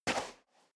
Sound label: step boots sand single right